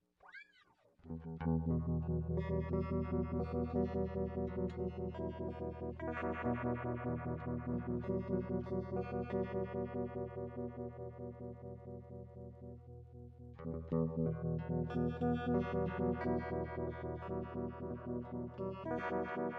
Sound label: mono phasor square